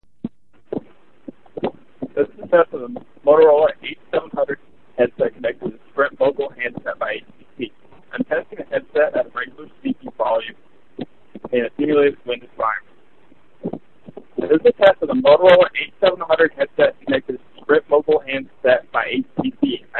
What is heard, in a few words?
Wind; Moto H700B Mogul